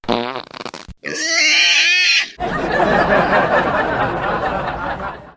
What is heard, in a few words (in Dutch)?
varken lachen; scheet